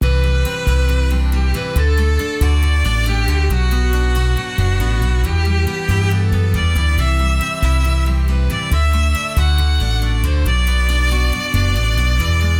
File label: acoustic; gorgeous moment; collaboration